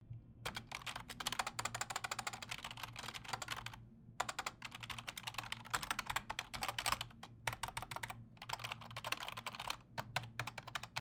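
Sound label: programmer hits, keyboard sound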